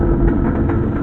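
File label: door start, movers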